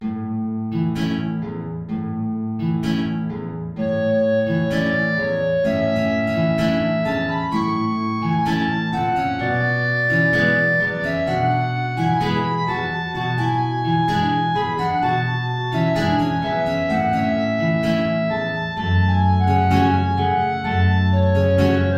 Tango Klarinette in Bb 2 Gitarren hoch

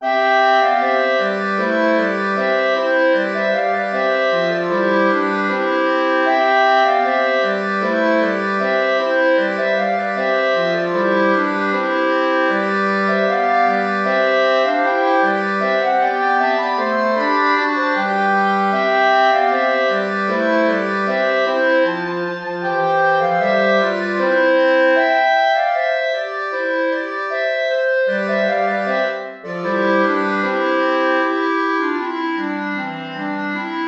4 Klarinetten in Bb; Deck the Halls

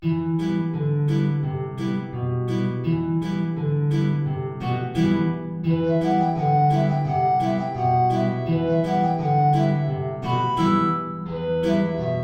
Altflöte Gitarre hohe Notierung; Chiribim Chiribom

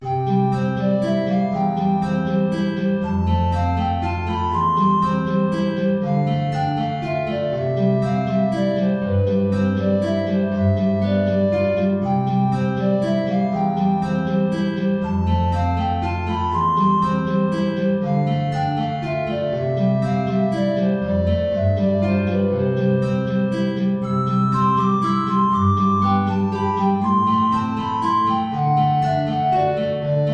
Menuet (Altflöte & Gitarre); tiefe Notierung; BWV Anh 114

